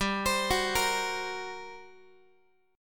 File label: G Minor Major 11th G down Guitar; arpeggiated; 4A,4E,5A,5D,5G#,6C#; GmM11